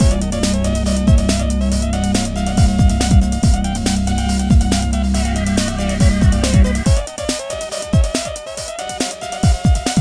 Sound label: Hardcore Breakbeat, 140 Cmin; Big Fish Audio - Hardcore Breakbeat Action; Hardcore Breakbeat Action CD1; 09 Get Down